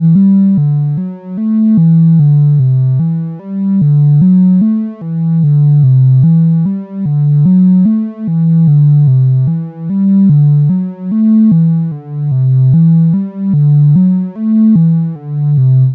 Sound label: MOTM-300 sine right - Polivoks LP resonance left